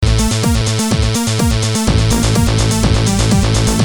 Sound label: depressing rock music